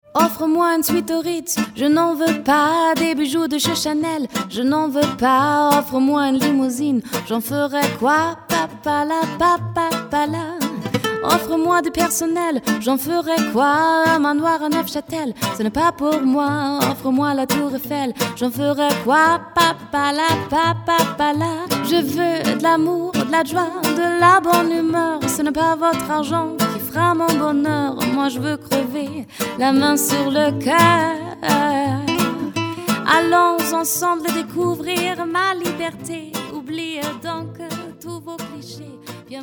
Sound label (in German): Gesang